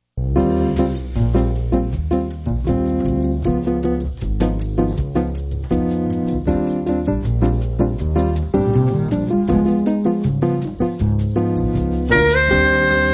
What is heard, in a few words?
(Instrumental)